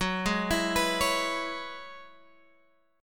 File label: Gb 7th Suspended 2nd Suspended 4th, Guitar, Open F; Gb7sus2sus4 arpeggiated